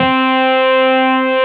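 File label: Wurlitzer C3 - R; USB Soundscan vol.09; AKAI; Keyboards Old School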